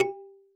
marimba kalimba; ゆめ2っき

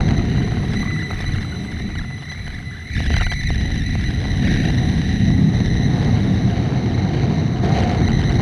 atmos; ゆめ2っき

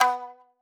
marimba shamisen; ゆめ2っき

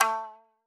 marimba shamisen

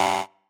marimba bike; ゆめ2っき

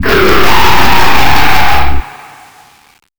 monster scream

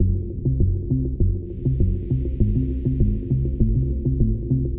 steam; ゆめ2っき

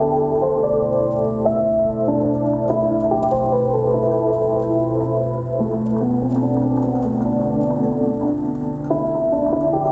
rain; ゆめ2っき

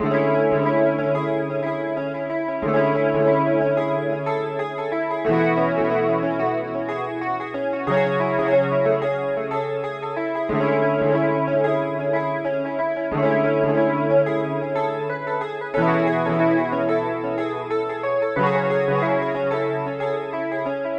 Rain Calm Loop; ゆめ2っき

